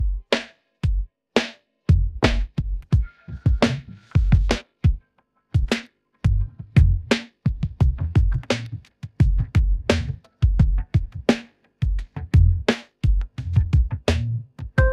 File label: ringtone hiphop